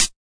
hat; Hats

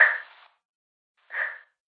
click breath; percussive; REMIXABLE COLLECTIONS; Ze Franks Pain Pack; pain pack percussive and bips and clicks

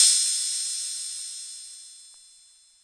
Korg KPR-77, drum machine

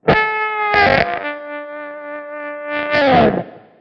guitar overloaded pluck

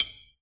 metal plink; Weird and Interesting Hits

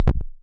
nasty stormfront; Electro FX; UNUSUAL KITS and FX

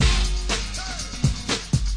121 bpm sue me flyby

